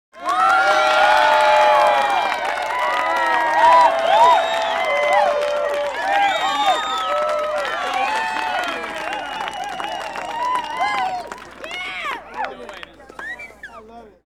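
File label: medium crowd applause; kids; Asian Show Sound Effects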